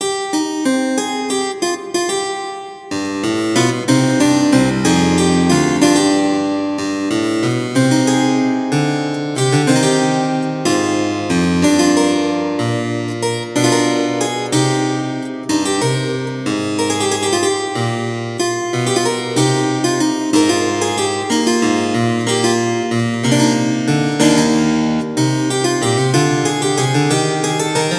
kontrapunktstudie; der hofnarr

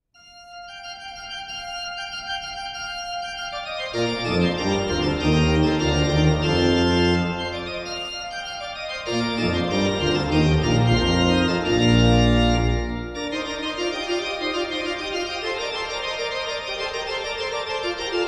praeludium dur; Buxtehude, Dieterich

